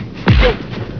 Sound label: HIT